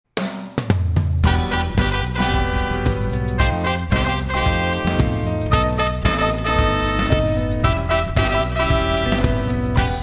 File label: Latin Dance